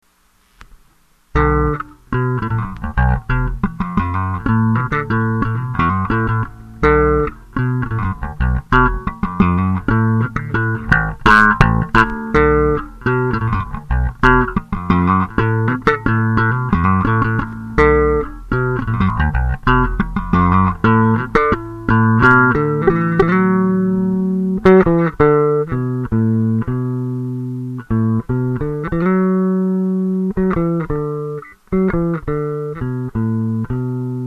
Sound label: power